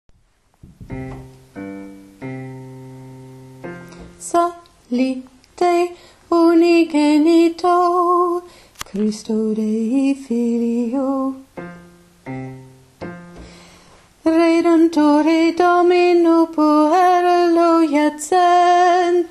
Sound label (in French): psallite alto lentement